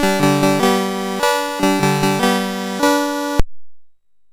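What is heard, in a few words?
Pancho, MOS 6581 SID chip music, games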